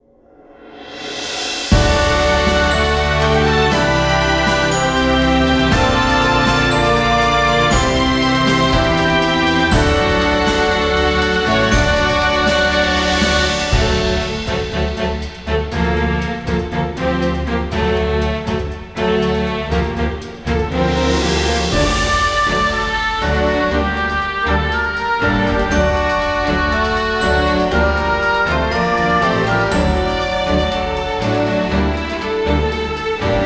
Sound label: Bgm field steam bright